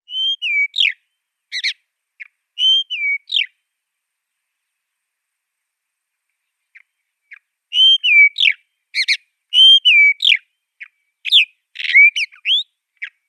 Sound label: Northern Anteater-Chat song